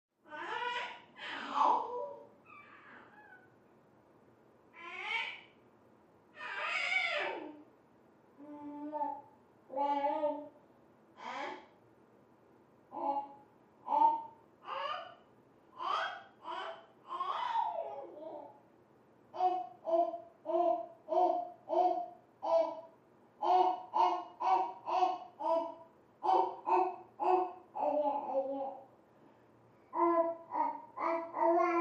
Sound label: Baby; Talking Playfully, From Next Room